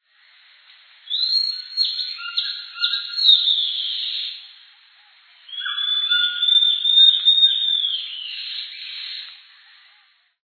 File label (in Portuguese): mae de taoca cabecuda